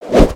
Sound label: hammer; aronwk collection; ndaudio